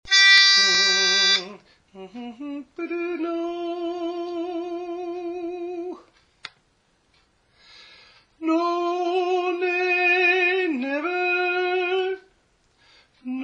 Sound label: Wild Rover (Tenor)